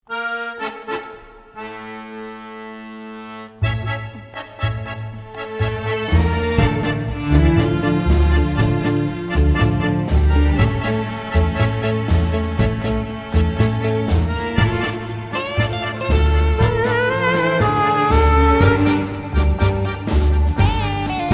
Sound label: Tango